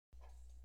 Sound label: glass hits